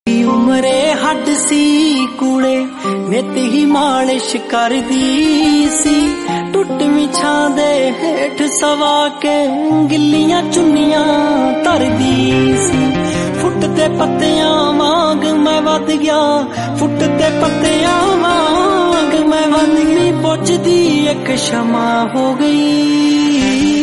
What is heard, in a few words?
punjabi